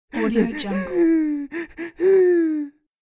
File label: male lost cry; human sounds